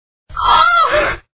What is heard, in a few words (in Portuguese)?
alarido